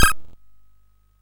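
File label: Nebulus, MOS 8580 SID chip music, games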